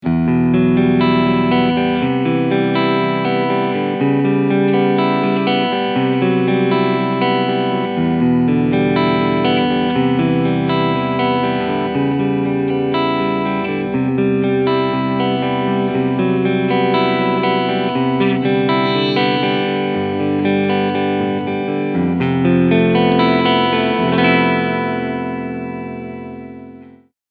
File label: Cleansound Front PU